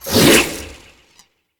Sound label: Whip Whoosh; Warframes; Khora